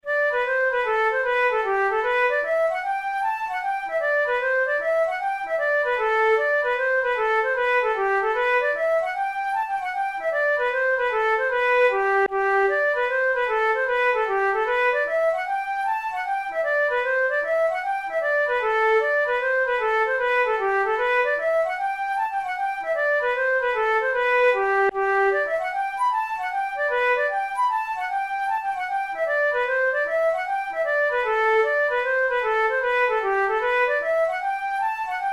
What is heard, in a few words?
hornpipe, flute; Pat Healy's hornpipe